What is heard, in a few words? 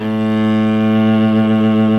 E1 SOLO CE, SOLO CELLO